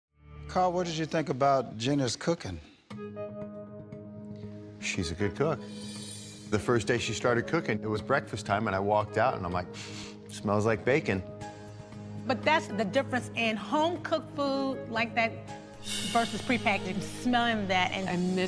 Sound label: Cymbal Swells; Reality-TV